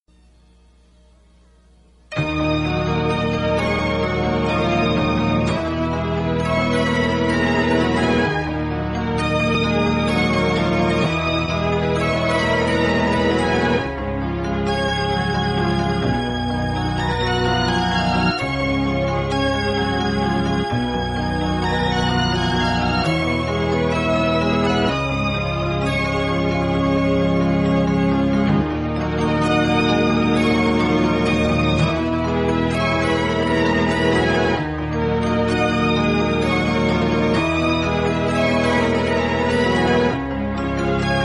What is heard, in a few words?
Instrumental